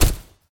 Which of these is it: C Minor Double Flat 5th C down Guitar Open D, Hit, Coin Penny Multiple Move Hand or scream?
Hit